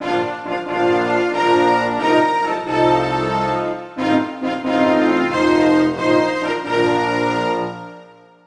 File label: Anthem Switzerland; Buzz! The Mega Quiz; PS2